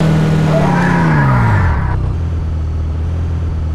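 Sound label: slowdown, vehicles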